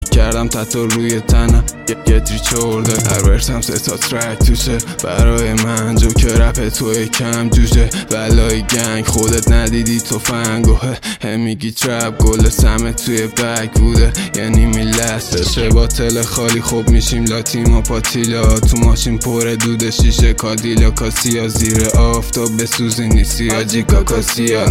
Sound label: Trap 128